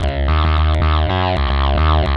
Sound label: Hard, Leads And Riffs, Synchro Funk; Sonic Foundry (Sony Creative Software)